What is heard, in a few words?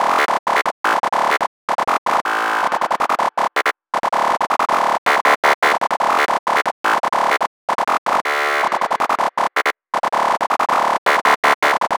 psy lead; looperman